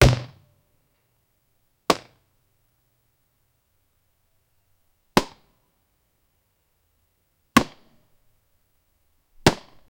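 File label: bullet hitting normal target, weaponry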